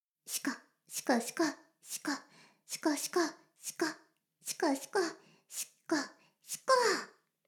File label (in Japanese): シコシコ音声, ASMR; 魔法少女マスカリマッスル